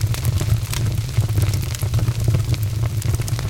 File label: Vehicles Generic